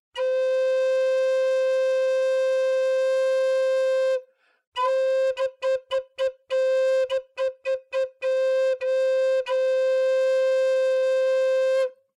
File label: C Am Flute